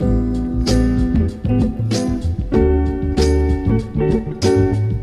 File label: guitar careless strums, Phonky Loops